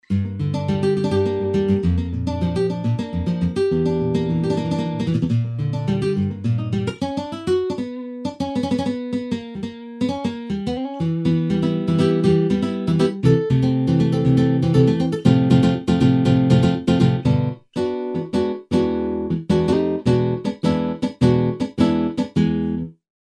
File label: nylon guitar